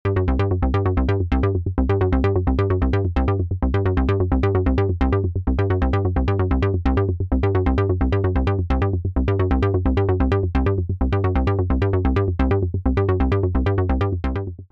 Simple Line; ACL System 2

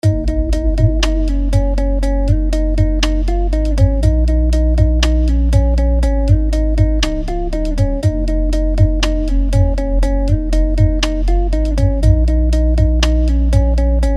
Notch Phaser; FilterShaper Core 2

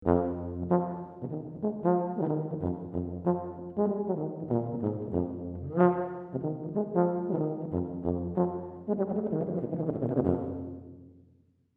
Tuba EMT250

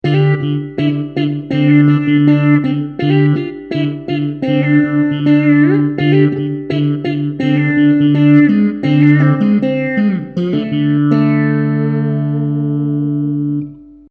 Sitar

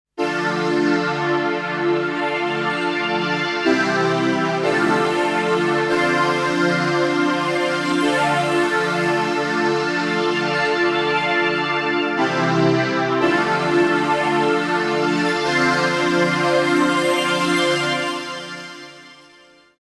Equinox Pad

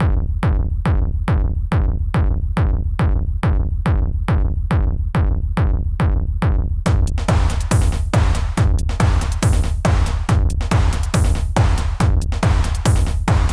RAW Kick

